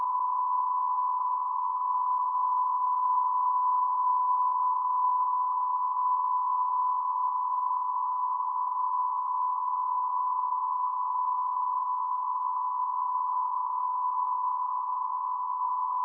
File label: 1kHz tone; optical comms